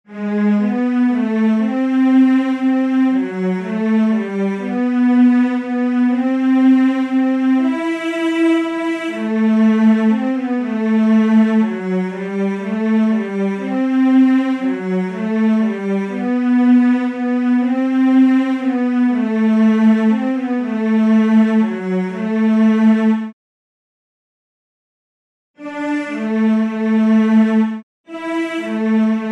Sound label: air and alleluia cello